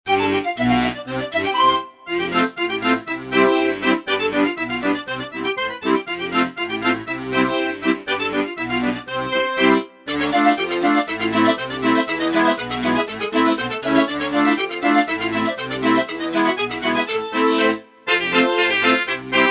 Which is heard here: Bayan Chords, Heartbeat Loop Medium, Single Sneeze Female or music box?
Bayan Chords